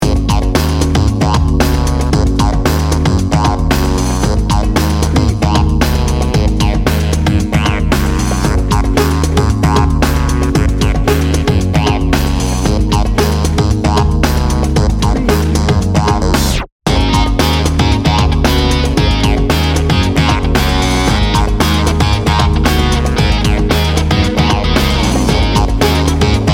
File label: bright and bouncy